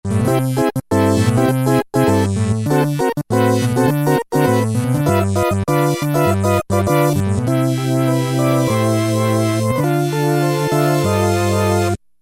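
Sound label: organ-Cheezoid; E-mu Orbit V2; Factory presets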